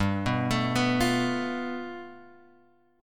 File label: G 9th, Guitar Open G